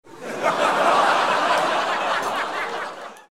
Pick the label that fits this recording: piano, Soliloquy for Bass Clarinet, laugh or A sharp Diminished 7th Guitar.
laugh